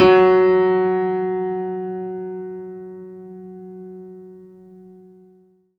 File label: F#3, Synth Line; 90 s Sample CDs; NiceBeats; SynthLine VOL-22